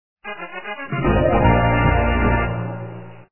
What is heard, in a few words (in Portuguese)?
vitoria; anacroz